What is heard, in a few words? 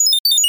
bot